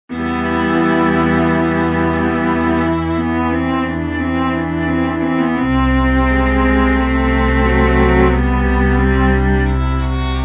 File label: Violin Quartet